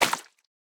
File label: Squished